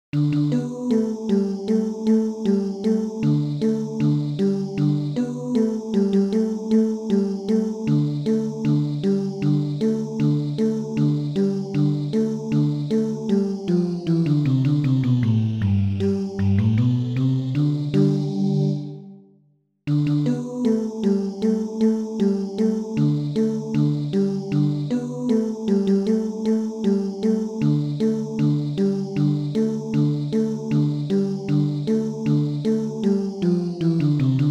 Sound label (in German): Die Gedanken sind frei Bass